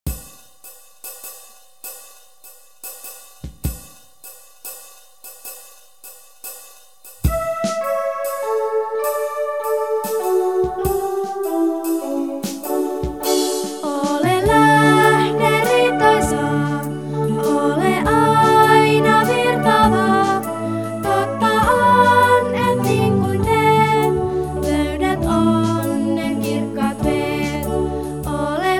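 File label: Song, Finnish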